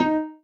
marimba rainbow

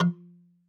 marimba kalimba; ゆめ2っき

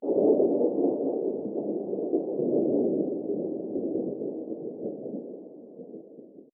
lightning

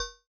sound metal sfx

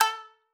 marimba shamisen

